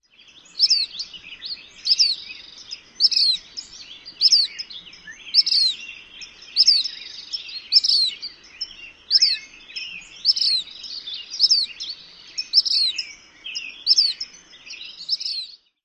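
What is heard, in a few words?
Black Phoebe dawn song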